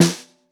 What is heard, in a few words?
Bottom, Snare; Ableton Foundation; Snare Designer